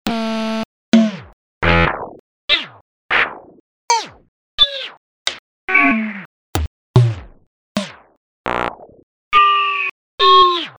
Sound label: synth